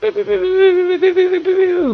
pew pew pew

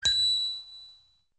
ding; disney

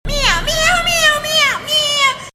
meow meow

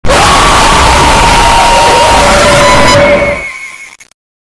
sun jumpscare

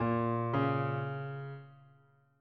piano

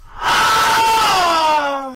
goofy aaahhh

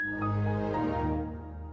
windows jaajajajajajj

